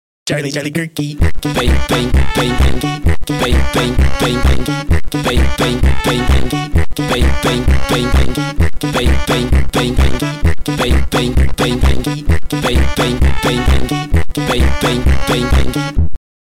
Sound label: funk